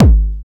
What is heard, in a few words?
Kick One Shot, Drums